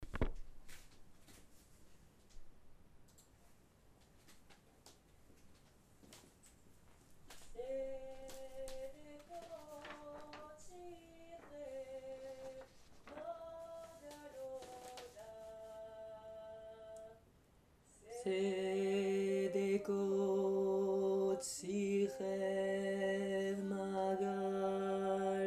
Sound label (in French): basse